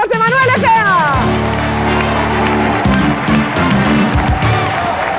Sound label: Fanfare, Guest host fanfare; This is used when a guest host enters to help with a mission and is similar to Letterman's Top Ten intro.